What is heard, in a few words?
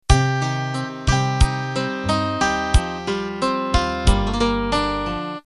8Beat slow 70s Intro